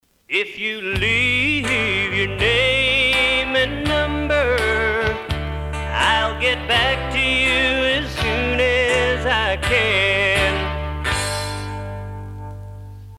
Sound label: Telephone Tones Country Number; ATT Telephone Tunes